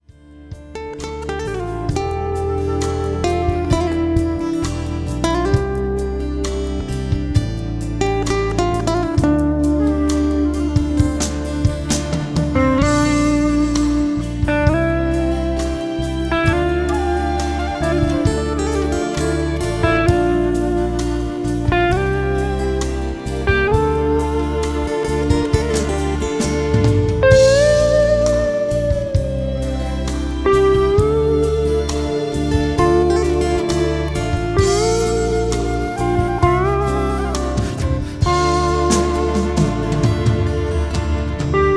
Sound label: Relaxing Guitar Dreams; instrumentale gitarren panfloetenmusik; MEDIA SOUND ART